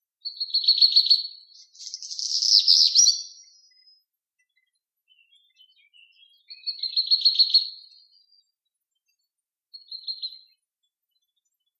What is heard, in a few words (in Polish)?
kopciuszek spiewajacy samiec